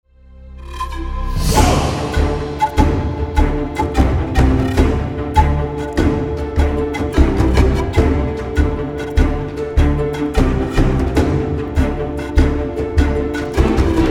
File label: Wolves in the woods